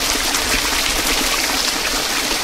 eau

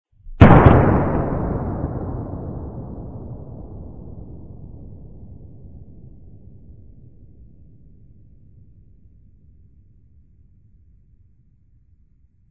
explosion loin

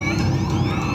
Alarme; Resurgence

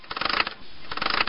grince

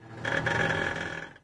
pont

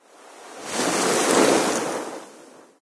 vague

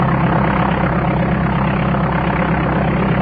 helico, ambience

